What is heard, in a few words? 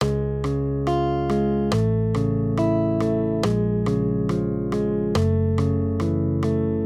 maj triads